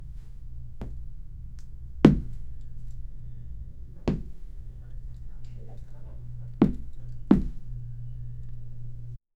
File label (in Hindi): aadmi kay girnay ki aawaz, fall